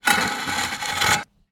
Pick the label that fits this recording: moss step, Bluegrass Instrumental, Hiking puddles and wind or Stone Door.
Stone Door